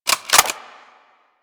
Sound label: Freeze Ray Reload; Warframe; Weapons; Corpus